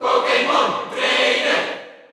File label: Cheer Dutch; Pokémon Trainer; SSBU